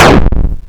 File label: purge impact boom; gae wild jam